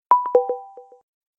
duo notification ringtone

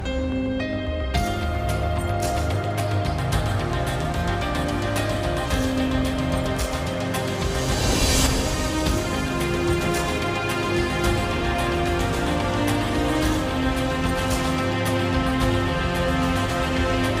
pakistani drama theme tone ringtone

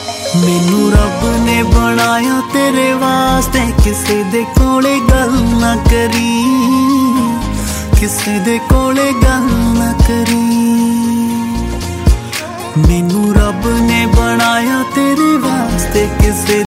ringtone

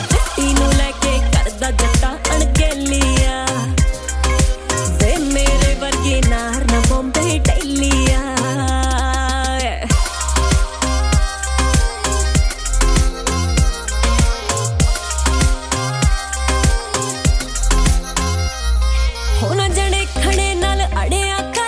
punjabi ringtone

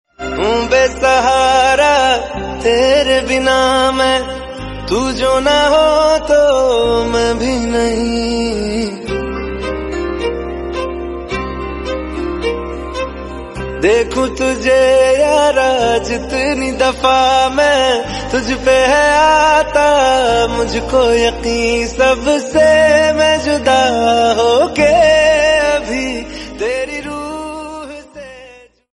sad hindi song tone ringtone; bollywood ringtones

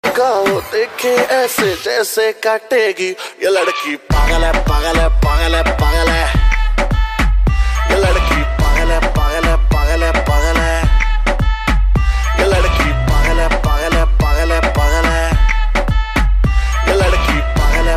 hindi music bollywood ringtone

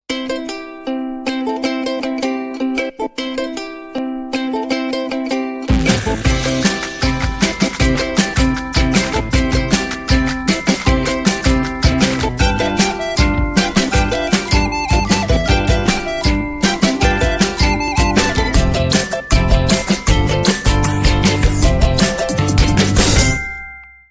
lumia1020 ringtone; brimful

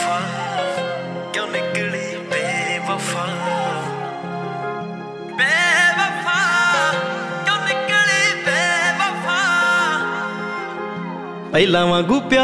sad ringtone

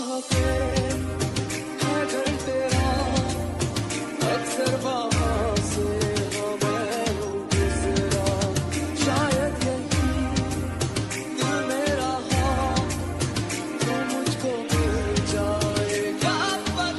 sad song ringtone; bollywood ringtones

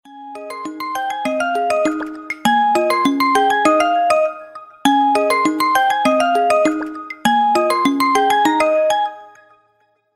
phone ringtone; lenovo

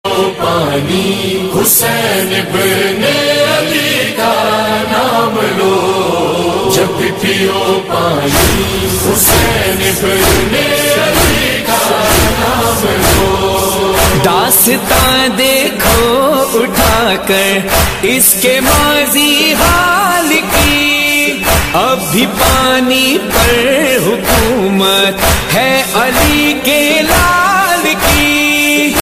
noha tone

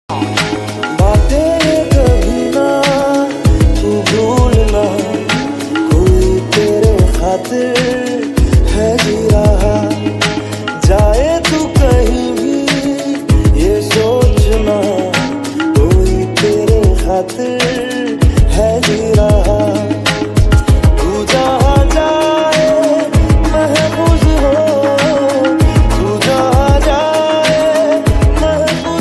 bollywood sad ringtone

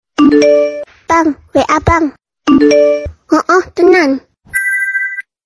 bang wa bang hooh short

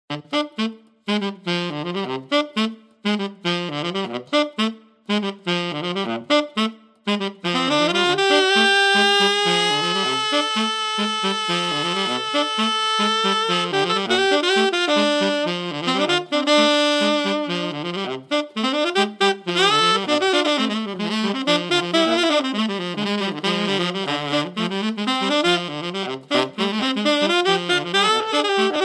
jazz cover ringtone; undertale

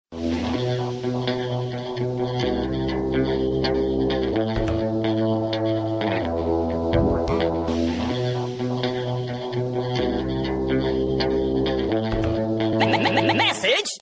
cool sms tone ringtone

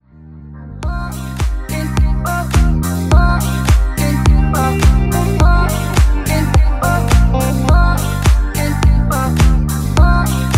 ringtone english ringtones

